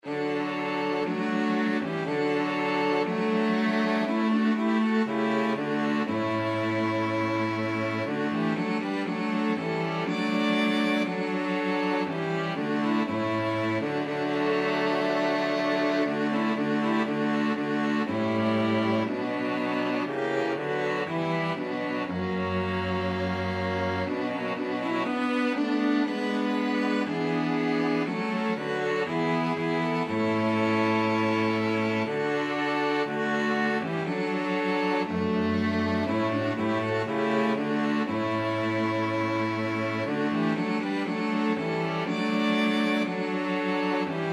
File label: string quartet